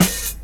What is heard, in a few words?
SD, Dance Mega Drums; Best Service; SD-HIP